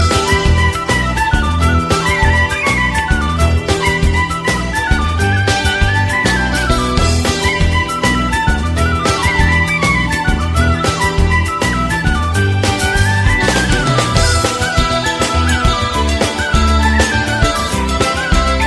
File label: Flut Music